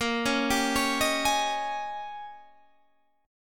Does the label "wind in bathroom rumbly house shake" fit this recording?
No